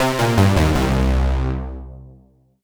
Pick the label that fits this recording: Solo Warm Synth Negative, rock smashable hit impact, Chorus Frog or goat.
Solo Warm Synth Negative